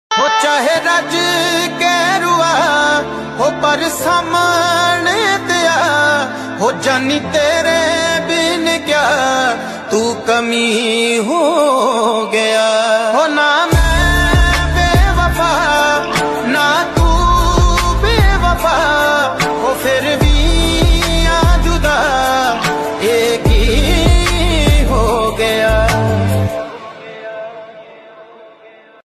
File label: sad punjabi ringtone